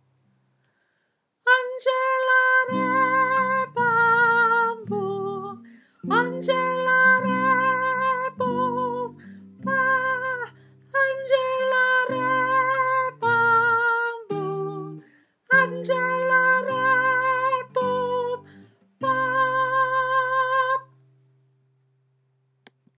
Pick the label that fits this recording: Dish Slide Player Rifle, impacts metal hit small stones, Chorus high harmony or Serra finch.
Chorus high harmony